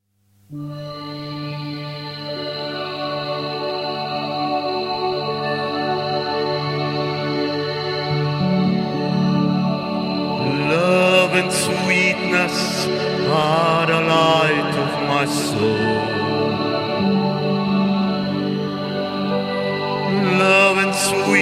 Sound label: Chant